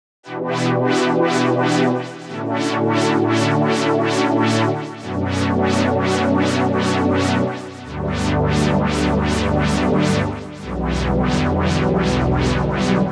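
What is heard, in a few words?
sequence callisto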